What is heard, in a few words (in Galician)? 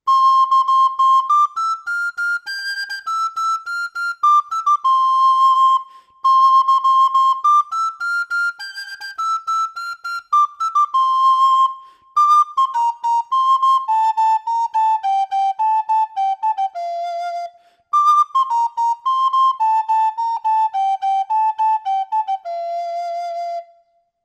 trad, Pito Galego; repertorio tradicional